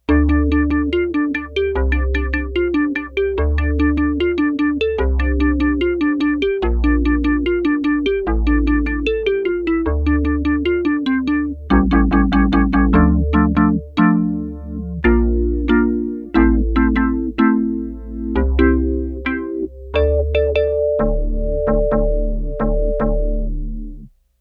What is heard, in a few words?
ANA SYNTH SYNTH SOUNDS